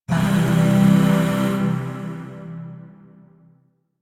Shawzin Maj Chord A; Music; Chords; Warframe; Zariman; Void

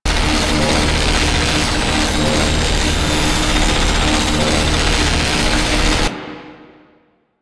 Mech Shocking Iron Loop; Warframe; Vehicles; NechroMech; Abilities

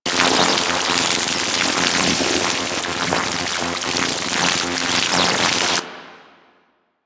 Microwave Pistol Projector Loop; Grineer

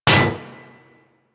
Bullet Impact Generic Enemy Shield; Surface

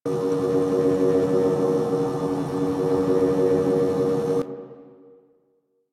Wall Machine; Warframe; Ambience; Corpus; Crp Outpost Defense Three

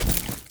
Walk Vegetation, Footsteps; Characters; Enemy; Lady Deora

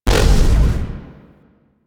Shotgun Proj Fire; Weapons; DE; Warframe; Tenno; Pod Shotgun

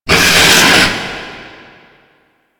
Breathe Out; Warframe; Stalker

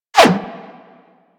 Bullet Parry Metal; Warframe; Bullet Parries

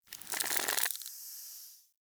Blind Sand Target Deactivate; Powers; Fey; Saboteur

